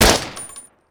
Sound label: BERETTA